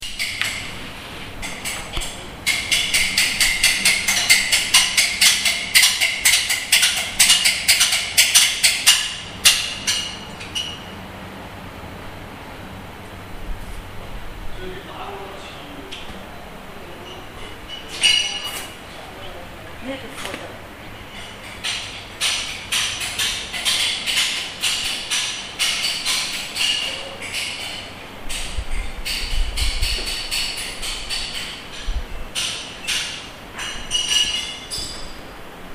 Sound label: clatters, street recordings